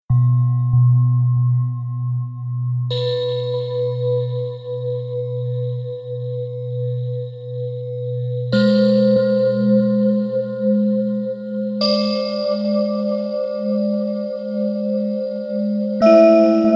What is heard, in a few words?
flashback